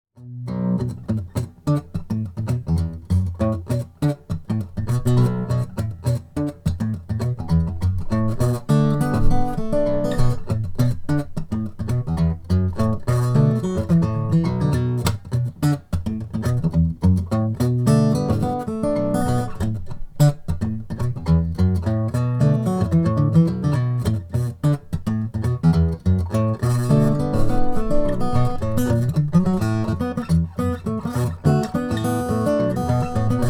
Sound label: petros baritone indian rosewood